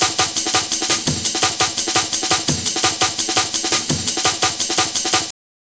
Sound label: Kickin Break, Drum & Bass, Beats & Breaks